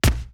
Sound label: Hit